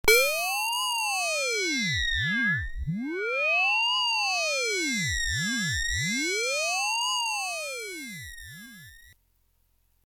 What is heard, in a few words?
fx pump